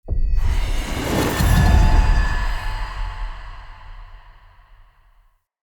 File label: Secret Room Unlocked; Puzzles